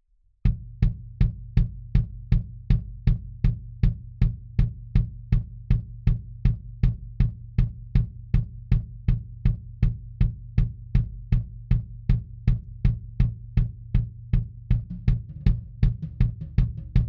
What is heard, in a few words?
Kick In, 160 BPM